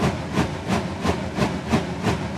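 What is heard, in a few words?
fan slow loop, ambient air; Half Life Alyx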